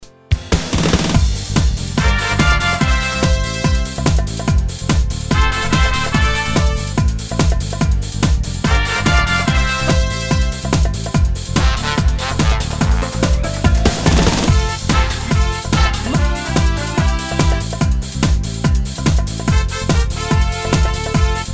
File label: Karaoke